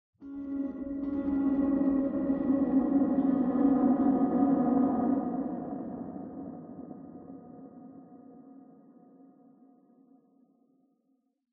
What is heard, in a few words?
Piano Disaster